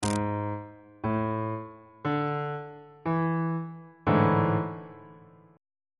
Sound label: a major 7th suspended 4th 3rd inversion on bass clef